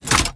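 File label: crossbow reload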